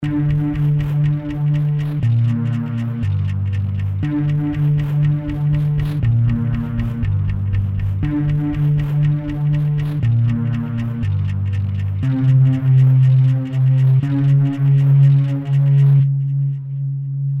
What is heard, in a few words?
Bass RHY Macro Modulation; Heavyocity Mosaic; Ghost Factory